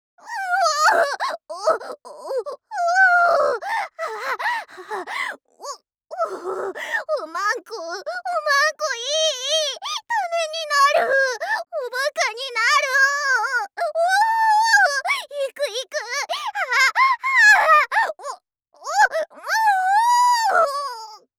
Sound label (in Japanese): んおぉっ おっ おっ ほぉっ